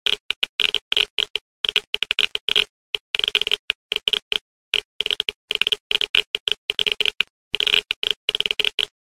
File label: geiger medium; Rust